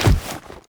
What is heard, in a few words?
knife push attack heavy; arccw go